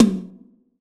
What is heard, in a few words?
tom